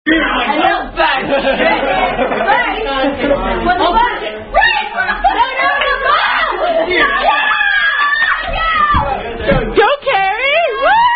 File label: Flip Cup, Drinking Game